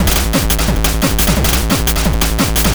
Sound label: PO Combo Gm, 175 BPM; loops; CHIPSHOP DELUXE